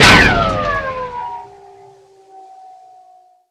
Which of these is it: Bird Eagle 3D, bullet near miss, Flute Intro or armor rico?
armor rico